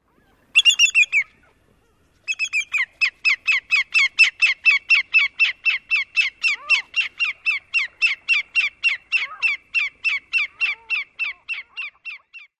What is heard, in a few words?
alarm calls